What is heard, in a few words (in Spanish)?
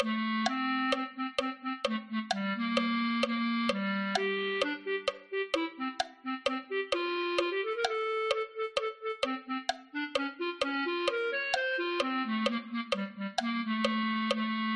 clarinet1